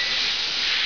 toxic plant

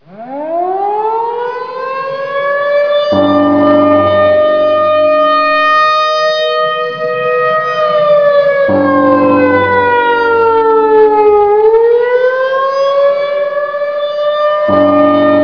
crossfire air raid

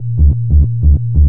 scanner combat loop; scmod

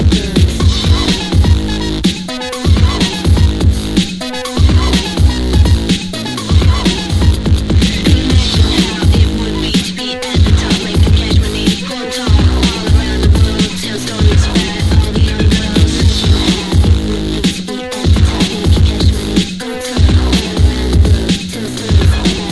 music

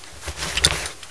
spas movement, weapons

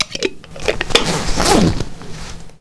suit

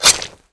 knife hit; rtcw

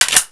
mp5 slide back

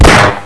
gun, weapons

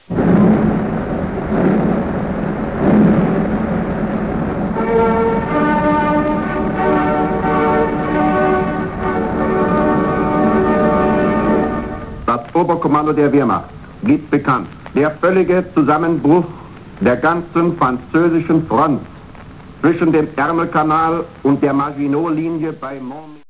german radio

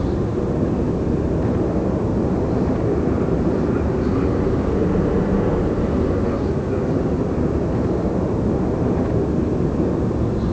train station ambient loop

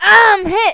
wound

